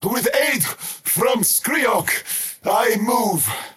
skywrath crown move pain; skywrath mage

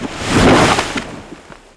treant spawn; weapons; dota; furion

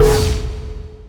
cask bounce; witch doctor

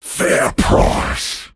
last hit pain; pudge

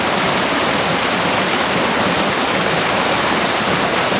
waterfall loop; ambient soundscapes; dota

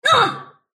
sylph pain; dota; dark willow

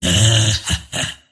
dismember laugh; pudge

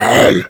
death furbolg champion; dota; creep deaths

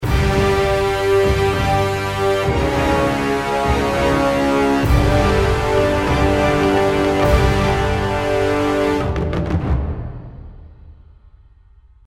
lose

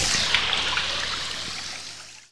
thunder clap target; weapons; dota; brewmaster

